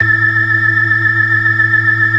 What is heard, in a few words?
FAST VIB, ORGAN, TREMOLO VIB